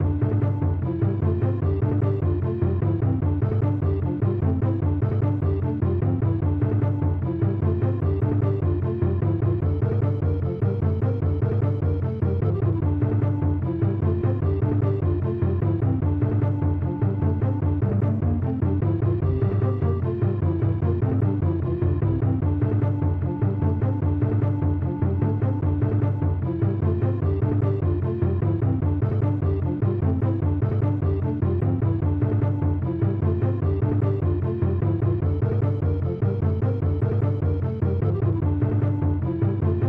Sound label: Jungle Rhythm; Pitfall The Mayan Adventure; SNES